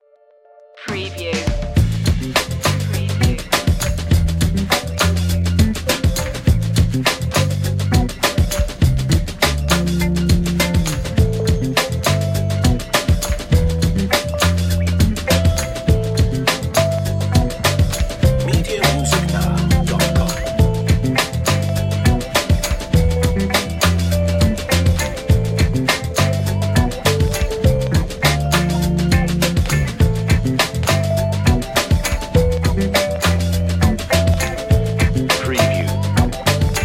get funky

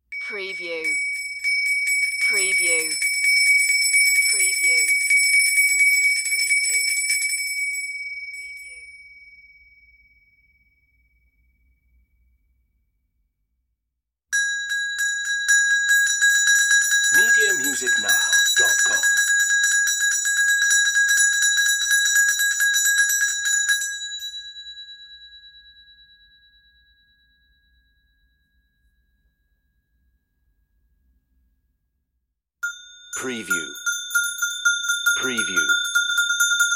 shaking bell

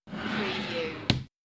Drawer Open Or Close

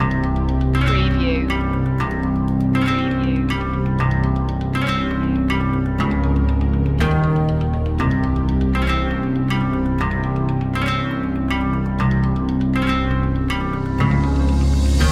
eastern mystic

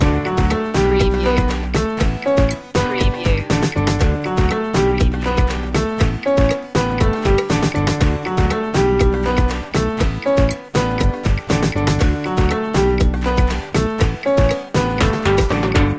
latin jump loop

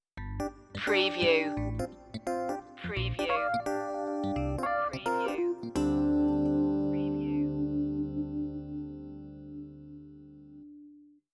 90s hip hop sitcom sting eps only

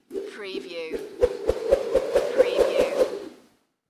Multiple Swish Circles

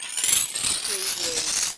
CARTOON WIND UP

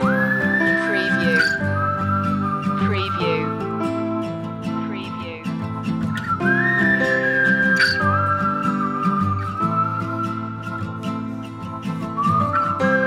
the whistle song loop

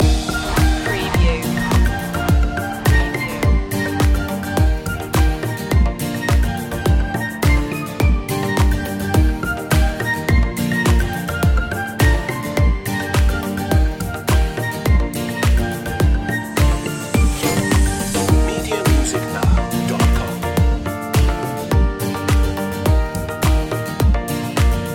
summer is coming loop

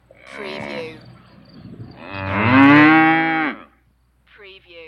Cows A Couple

